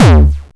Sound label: Hardcore BD, Nord Modular Drum Samples; Yawgmoth